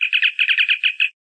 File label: Communicator